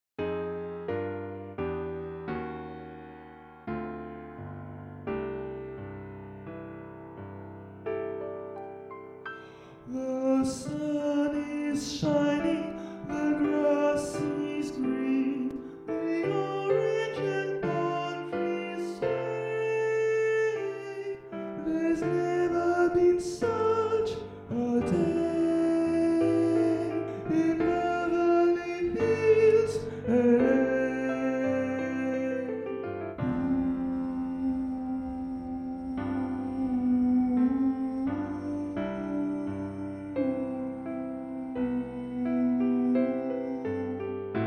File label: mezzos